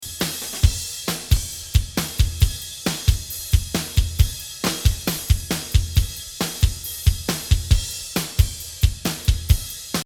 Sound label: processed drums